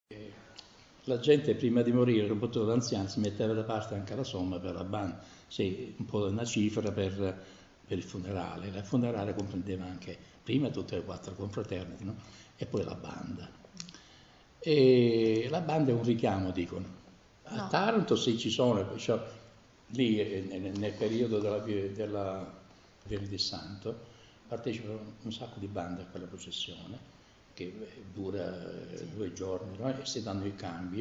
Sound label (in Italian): banda funerali; Carovigno (Br)